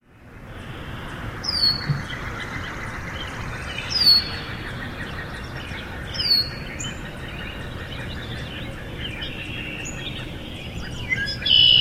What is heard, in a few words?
redwing